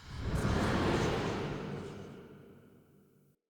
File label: Diorama Whoosh Out; Cinematics; Orengall Quest